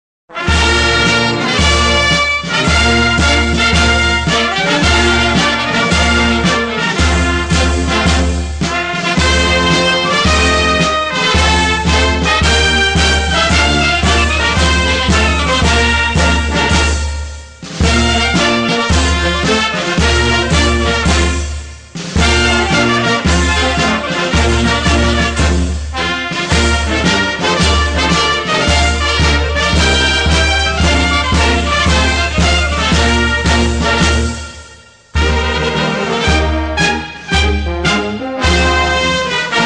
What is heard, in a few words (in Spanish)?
marcha militar peruana; marcha banderas